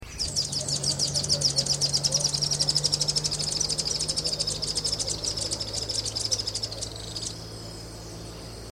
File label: Pink legged graveteiro; پرنده گورمرغ پا صورتی